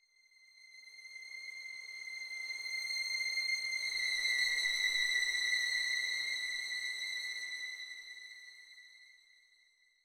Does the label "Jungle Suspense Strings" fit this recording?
Yes